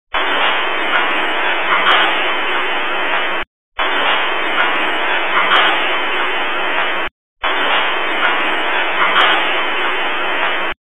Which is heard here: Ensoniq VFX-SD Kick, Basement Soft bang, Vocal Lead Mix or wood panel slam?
Basement Soft bang